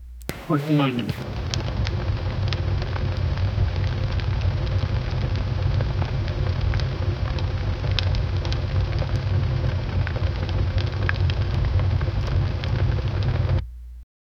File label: intense whoosh of air fee, fire